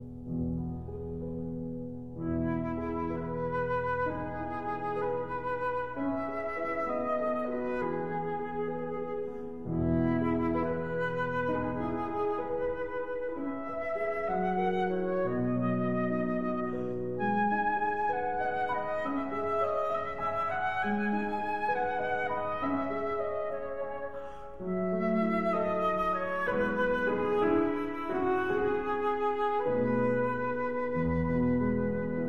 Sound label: Berceuse (Lullaby) for flute and piano